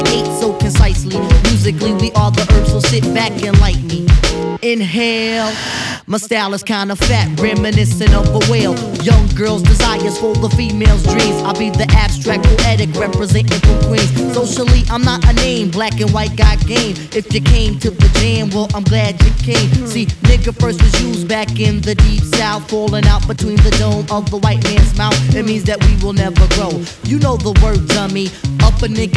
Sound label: hiphop